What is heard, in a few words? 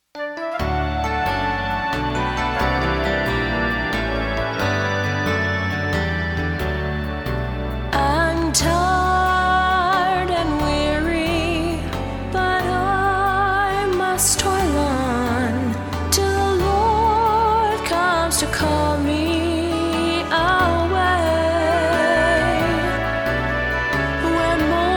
SATB Gospel Medley